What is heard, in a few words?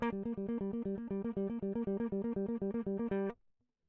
electric